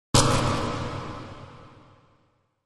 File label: Electro Static Shot